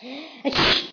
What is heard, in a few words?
sneeze; TomCat